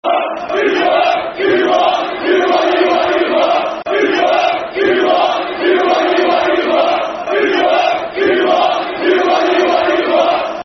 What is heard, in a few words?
Football Chants (Soccer Songs); You What; Portsmouth Pfc